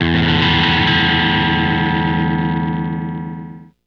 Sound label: Grunge E, Guitars; Total Drum&Bass